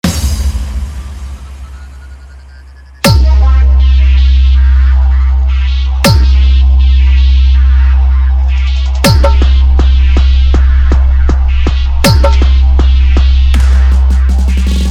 Full Dhollki Bass Rod Show Dance Mix

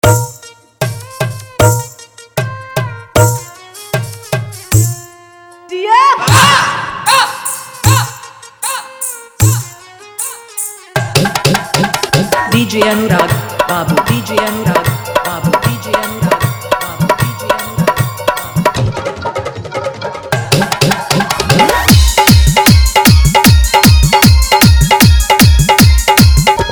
Full Dhollki Dance Mix

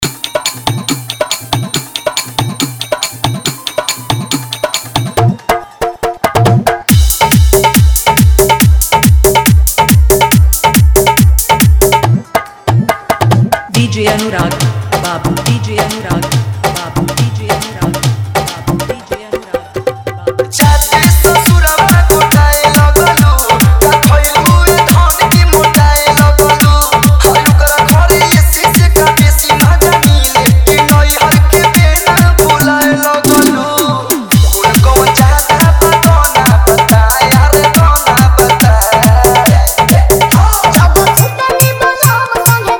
Hard Dhollki Bass Dance Mix

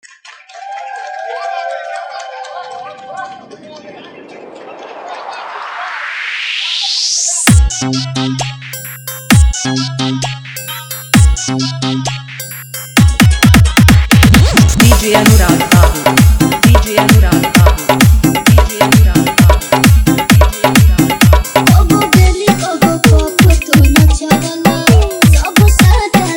Tabla, Hard Dhollki Bass Dance Mix

